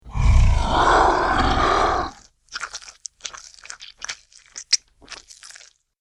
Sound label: Dragon, Monster Sound Effect; Dragon - Packed Lunch; Andrew Fleming